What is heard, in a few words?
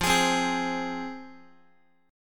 F Minor F down Guitar; strummed; JGuitar